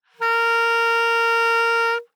Bass Clarinet ff Bb4 stereo; Woodwinds

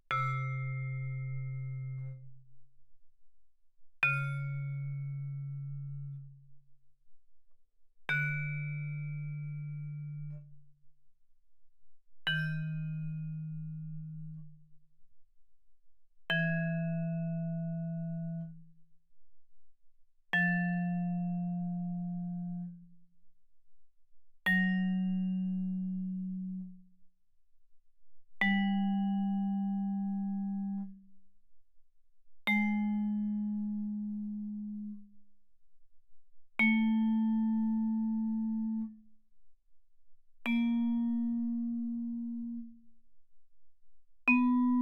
Vibraphone short sustain ff C3 B3; Percussion

